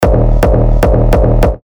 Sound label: Kick D#; Fafnir; terra kicks; Revealed Terra Kicks